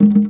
arabic perc, Arabic Percussion Kit

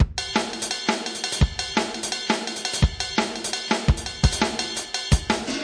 170 acoustic dnb, drum loops and breaks; 161 to 180 bpm

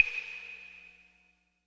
urban trap percussion block; Hip Hop; Urban Trap Kit; style kits

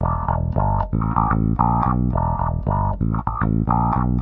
walkup wah bass; BASS LOOPS

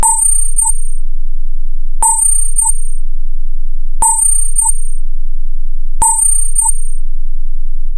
electronic hipitch cricket; SYNTH AND ELECTRONIC LOOPS

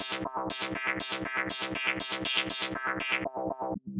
squirt zone synth; SYNTH AND ELECTRONIC LOOPS

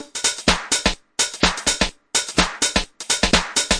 126 bpm, hats in my house, drum loops and breaks

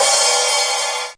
rs7000 hh cy a4, Yamaha RS7000 Cymbals; drum machines

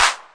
808 clap, drum machines; 808 Extended

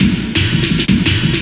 170 warehouse breaks; 161 to 180 bpm

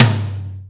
TOM LOW, Power Drumkit; Hip Hop; style kits

